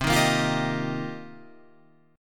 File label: C-Minor 11th-C-12,10,10,12,10,10, down, Guitar, Open F, Cm11 chord; strummed; JGuitar